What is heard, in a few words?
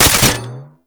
Archgun Low; Warframe; Weapons; Archwing; Tn Nokko Archgun